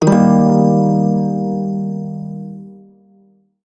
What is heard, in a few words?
blues chord, shawzin; Warframe